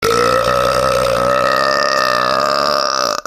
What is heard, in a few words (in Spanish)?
eructo vomito